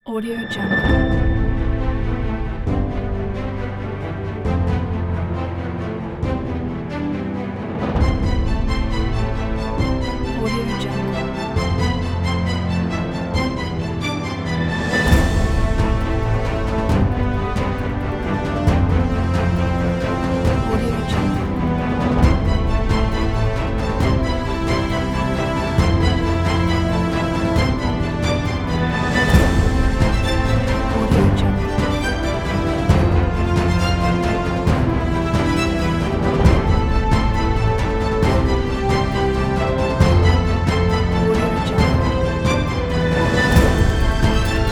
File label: epic music pack